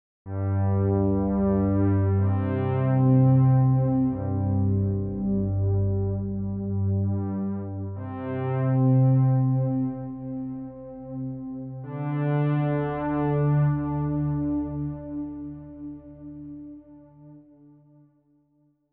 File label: GeoSonic Bass